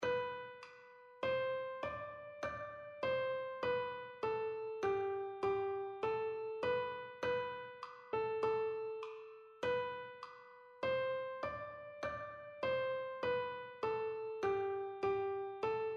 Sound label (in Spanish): himno alegría notas flauta